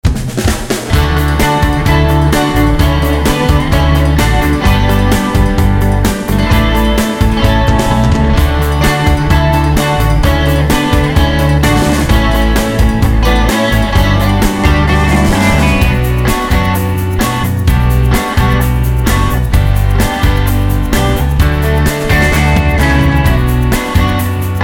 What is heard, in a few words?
Instrumental